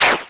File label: Chain Move Back; Sheik moving the extended Chain back and forth ("Front" heard during Sheik's side taunt); Zelda